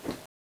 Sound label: fists miss, weapons